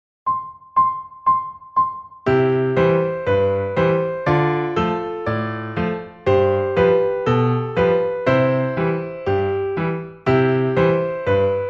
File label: Zauberklavier 120; Uebungs-CDs; Playbacks; Aura Lee